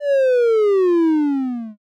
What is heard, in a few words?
sleep magic